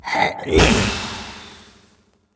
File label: mushroom boss gas